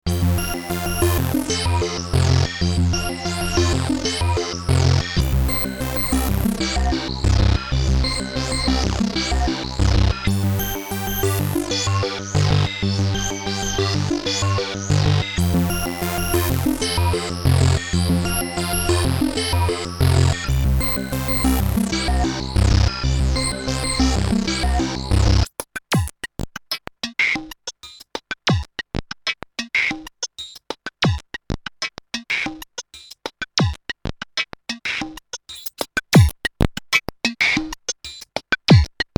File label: synthpop; radias